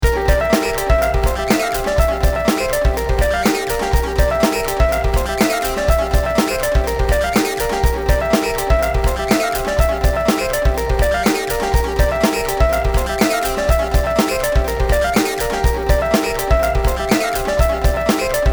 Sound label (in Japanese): BGM; ドラマジ